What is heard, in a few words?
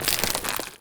foley, zombie revived; scripted intro; Half Life Alyx